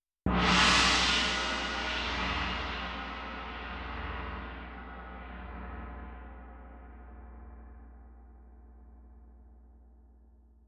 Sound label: Tam-Tam Rear